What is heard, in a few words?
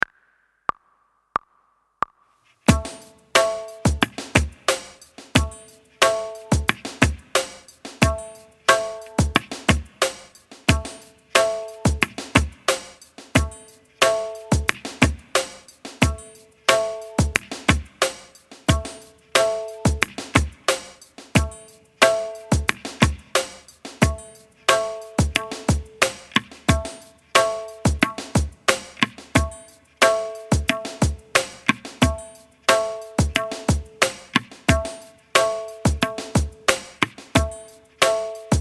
Ta Practice with Na and Te With Tabla